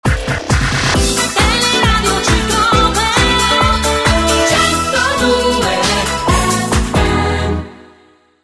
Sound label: Jingle